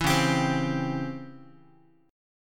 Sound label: C sharp Minor Major 7th Flat 5th Guitar; C#mM7b5 strummed; JGuitar